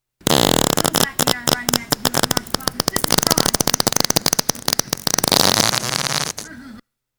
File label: fart